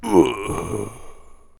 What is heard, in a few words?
Player Dead; Rogue-Like In C# Programing & Unity 2D Game Development 2022